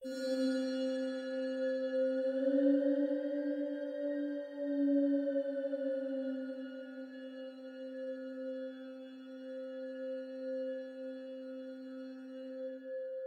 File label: Forest Ambi; Moonrise Fall